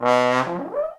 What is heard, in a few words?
TROM DOIKS